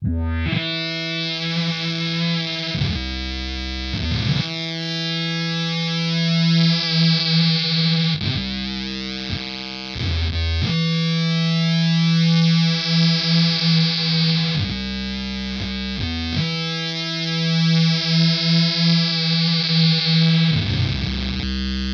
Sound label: MonoSynth; Cooper FX Arcades